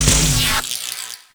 Shield Spawn B; Enemies; Weapons; Warframe; Arachnoid Camper

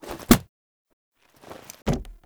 Book Close; Tau; Protoframe Room; Roathe

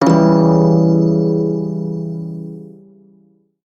Prime Shawzin Phrygian Chord, Music; Warframe

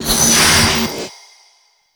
Stun Start; Warframe; Stalker

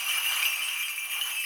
Boon Cancel Loop; Warframe; Warframes; Koumei

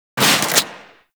Crossbow Shot C; Tenno; Garuda

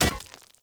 Short Sword Medium Impact Rock

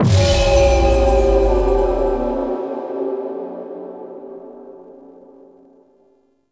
Platform Activate Fail; Warframe; Ambience; Cephalon Weave

